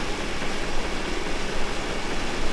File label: subway move slow loop